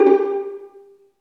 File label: String Vlns Pizz